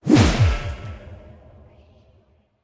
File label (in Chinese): 不祥试炼刷怪笼激活声